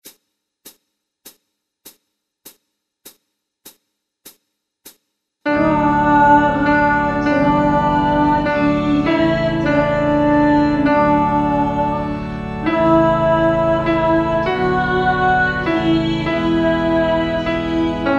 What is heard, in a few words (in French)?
Messe de St Boniface Anamnese Guide Chant Alti